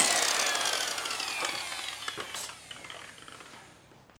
whirdown WHH

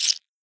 blood Short SKLE

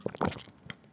slurp SLRP

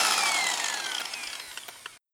whirdown Short WH

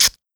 blood Short

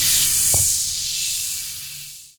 foam Spray Fade Out HSS

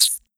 blood Short SQLEE

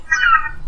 seagull Chatter CHUGUGUG

